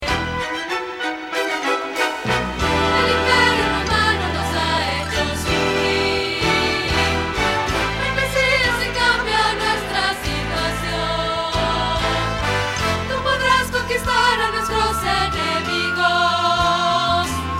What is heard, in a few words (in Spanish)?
Contralto